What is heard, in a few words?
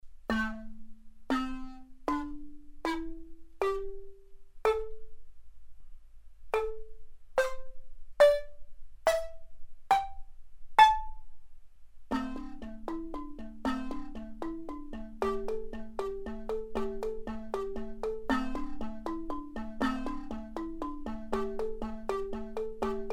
balafon